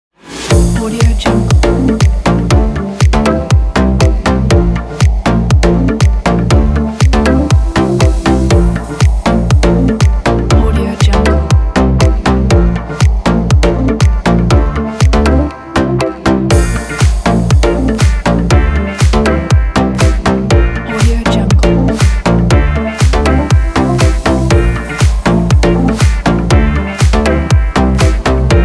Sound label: energetic autumn pop